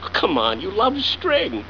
STRING; Come on, you love string!; Mouse Hunt

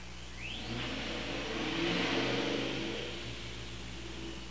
slow takeoff; '98 Procharged GT; The exhaust is stock with a set of 2-chambered delta-flow flowmasters.

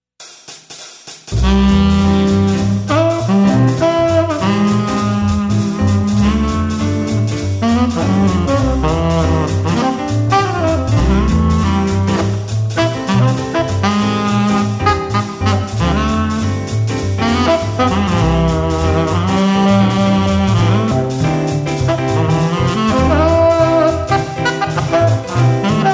sax

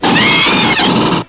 Alien scream

